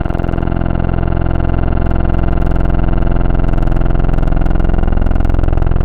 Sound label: SID PWM; Synthetic's Serum Collection